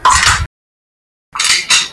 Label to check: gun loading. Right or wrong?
Right